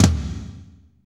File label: TOM, Power Ballad; Northstar; Drumscapes Roland